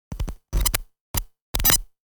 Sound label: Connectivity malfunction electrical interference; sound design effects